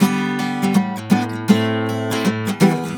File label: GTR6, Celtic Flavours; USB Soundscan vol.42; AKAI